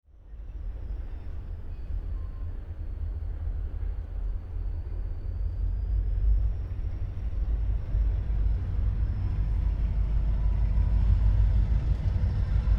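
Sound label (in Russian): Маневровый локомотив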